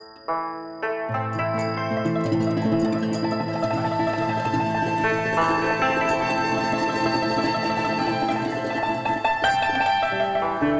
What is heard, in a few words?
TRADITIONAL Chinese, Picking Flowers